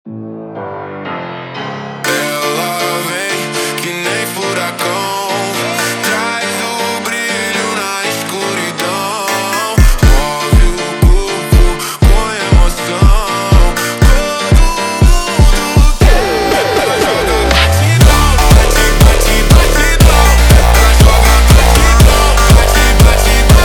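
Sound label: slowed, phonk music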